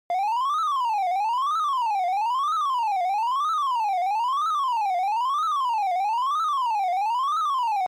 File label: Arcade General LFO